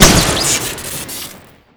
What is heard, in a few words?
aa12 shoot crit; weapons